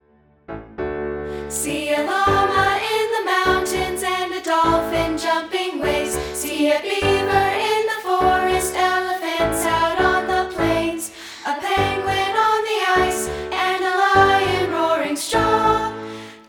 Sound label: Piano